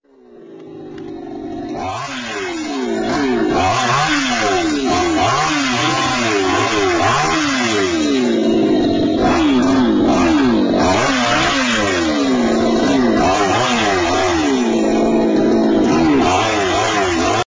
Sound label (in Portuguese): motosserra